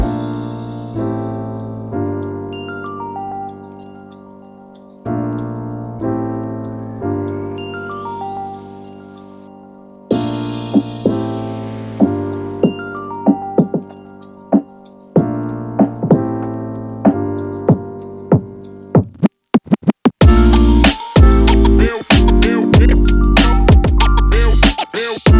hip hop urban

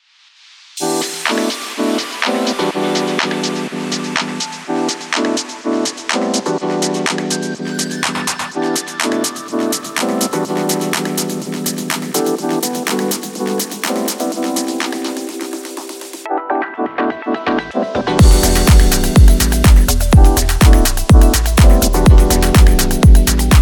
lounge house

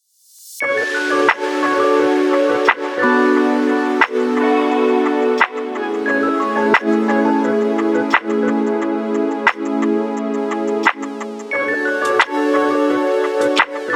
vlog hip hop

